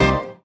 salsa, stabs

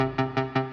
sudden impact; Loops; Electronic

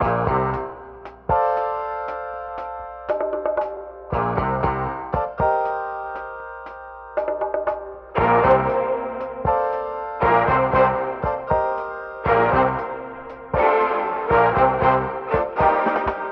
horns and keys, loops; Phonky

